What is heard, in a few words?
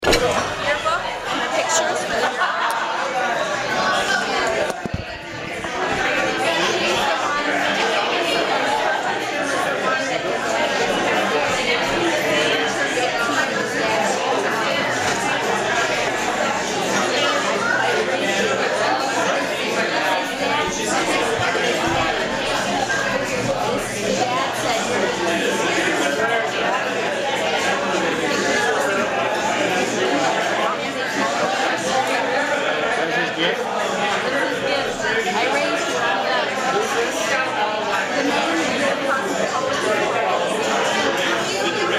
busy bg noise